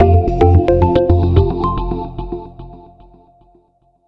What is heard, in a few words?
Mission Accept Jingle; tokyo 42